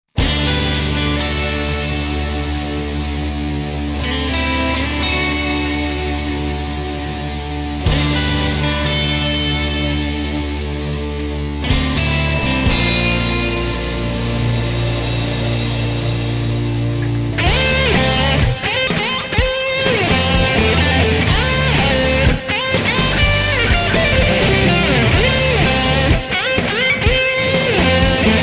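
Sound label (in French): Style rock; guitare; Youri contest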